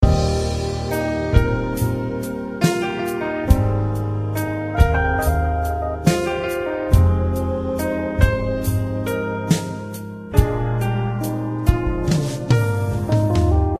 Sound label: Romance Loop